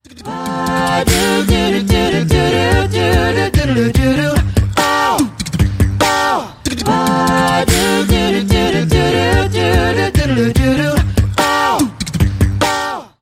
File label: penta tone